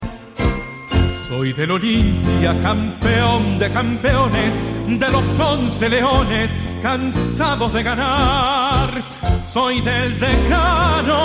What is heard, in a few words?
Tango